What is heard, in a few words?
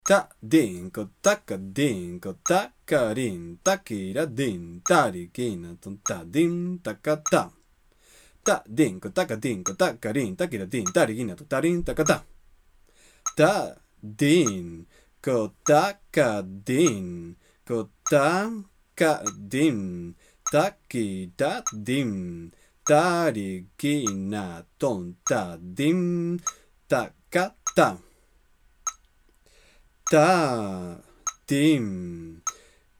same phrase khanda diff speeds